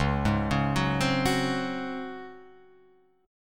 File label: C sharp Major 7th Suspended 4th C sharp Guitar Open F; C#M7sus4; arpeggiated